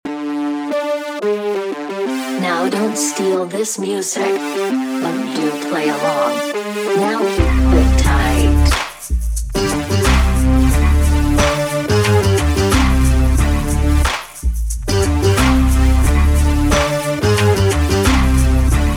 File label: Hip Hop Glitch Loop 90bpm; Rhyme Vibe